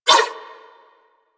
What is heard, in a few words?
Nunchaku Whoosh; Weapons; Warframe; Tenno